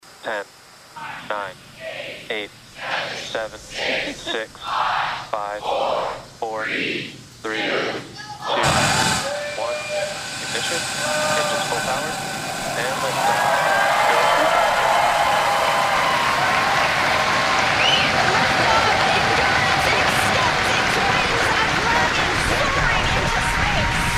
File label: SFX LIFTOFF